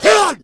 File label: smoker attack, voice; Left 4 Dead 2